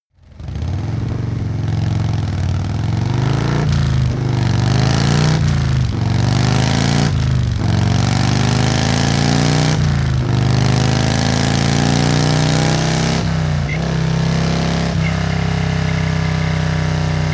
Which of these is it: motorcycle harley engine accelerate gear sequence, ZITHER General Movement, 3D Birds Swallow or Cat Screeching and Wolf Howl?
motorcycle harley engine accelerate gear sequence